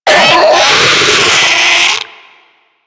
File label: Infested Diseased Ancient Death; Warframe